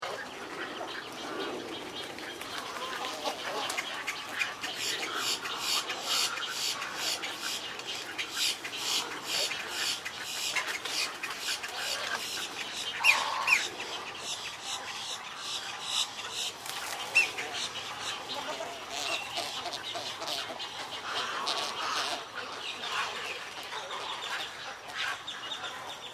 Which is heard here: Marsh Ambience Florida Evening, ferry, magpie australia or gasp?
Marsh Ambience Florida Evening